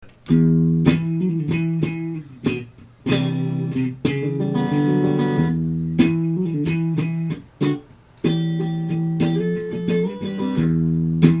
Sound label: folk Original, riffs